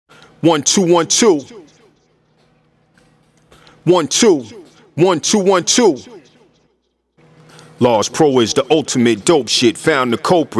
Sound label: acapella